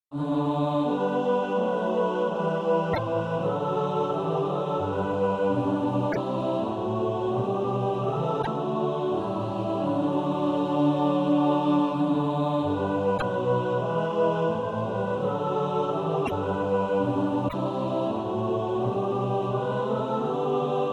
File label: mixed; Lord speak to me